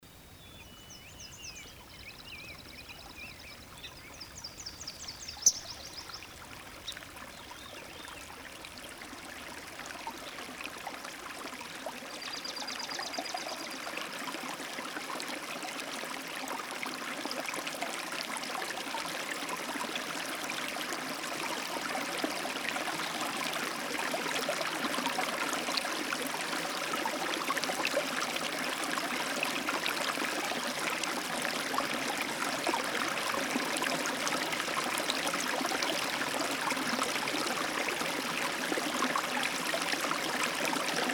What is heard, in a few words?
Morning Songbirds, Sparkling Water